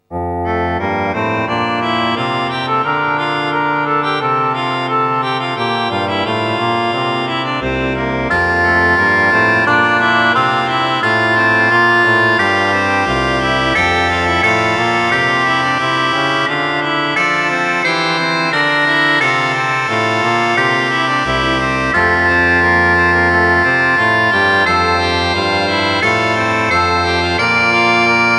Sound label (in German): nun danket all und bringet ehr, orgel